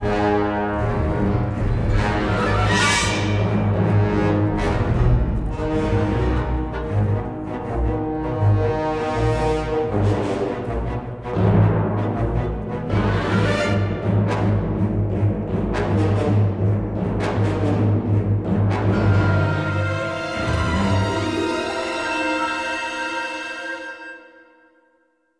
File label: tension, music